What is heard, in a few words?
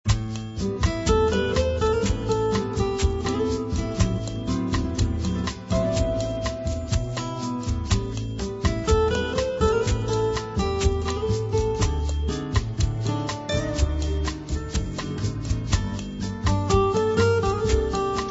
bossa nova; Easy Listening